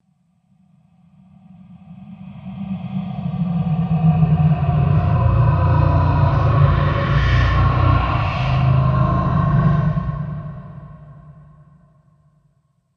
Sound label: Deep Space Demon, Alien Sound Effects